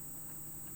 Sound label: Hydrophone